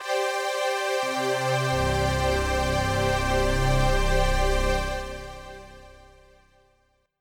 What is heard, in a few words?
syn strng; 16 Bit Digital Sound Masterpiece